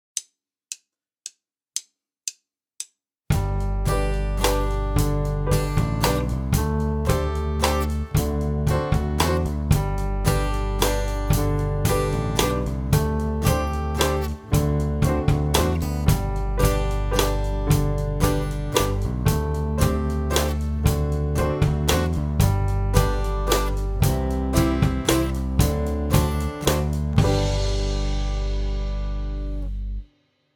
Improviseren met d c b a en g

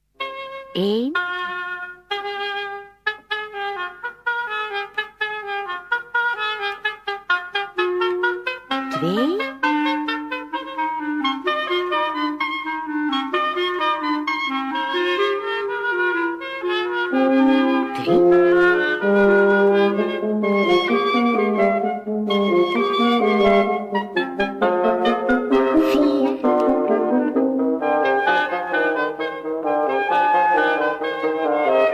kwintet fuga hobo klarinet hoorn fagot dwarsfluit; Wie zet er in

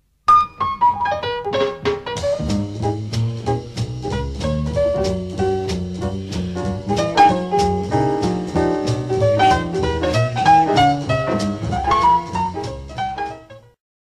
Pianovoorbeeld jazz

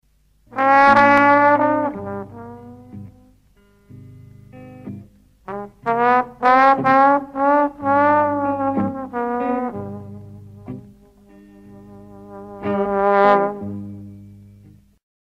voorbeeld met glissando; Wel of geen glissando

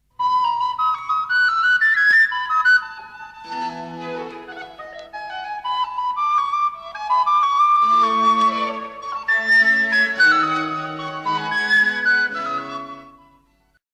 Houtblazers blokfluit fragment; vivaldi

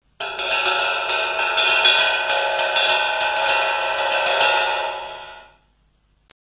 Metaal bekkens

